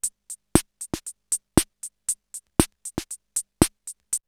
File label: CR78 BeatBox, drumloops, DrumMachines